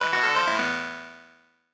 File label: Core Console Start; Game rip; MiSide